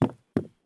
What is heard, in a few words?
turn wood; Escape from Tarkov